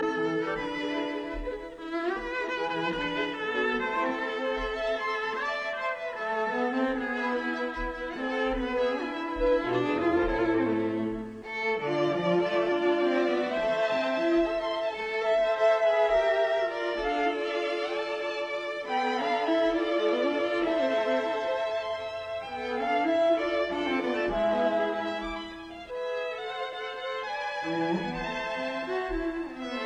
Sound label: Danzas de Panama version for string quartet, Tamborito Moderato; Louis Kaufman